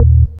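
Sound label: organ, Stabs; E Lab; XTc Files of House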